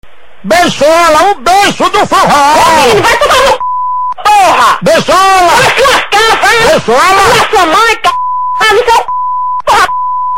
forro

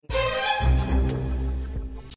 a fazenda toque

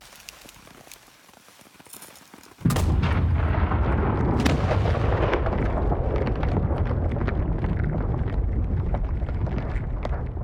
explosão de fundo

